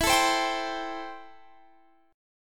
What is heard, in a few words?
A-Minor 7th E